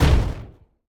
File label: shoot; Mindustry; Scepter